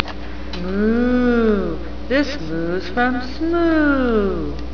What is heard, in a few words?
moo